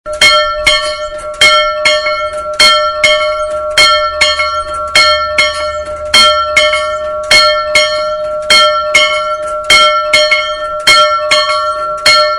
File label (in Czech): zvonek; bukovec